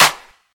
clap one shot c sharp key, Royality free clap sound tuned to the C# note. Loudest frequency: 3528Hz; single hits one shots